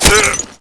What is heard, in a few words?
allied die; dod